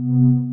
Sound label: Sci-fi Energy Glow Loop; SFX Kit; Sci-Fi Loops